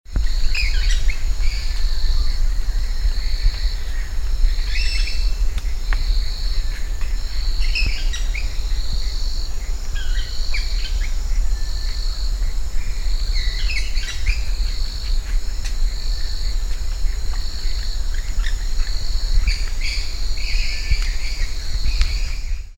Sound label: black capped parakeet